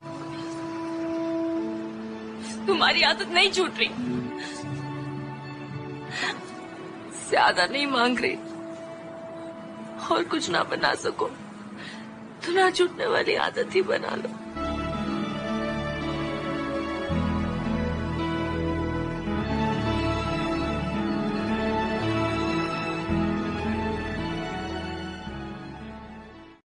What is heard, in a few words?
very sad ringtone, bollywood ringtones; very sad status ringtone